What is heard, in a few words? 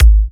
Kicks G#; markus hakala